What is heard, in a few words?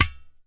udu SLAP, Udu Clay Hand Drum; ETHNIC and WORLD PERCUSSION